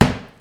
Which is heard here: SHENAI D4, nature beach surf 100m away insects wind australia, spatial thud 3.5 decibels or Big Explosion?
spatial thud 3.5 decibels